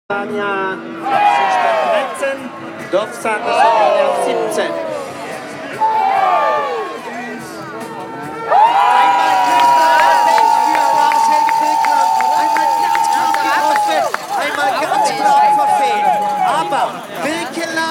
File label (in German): norwegische fans feuern am schiessstand an; birkeland